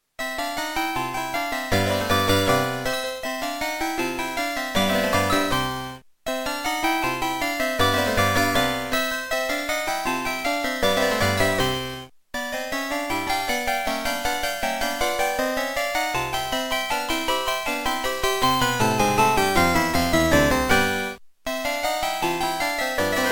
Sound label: Beepblaster 2.0; BEEPMODE; classical; Mozart; Concerto K467 partial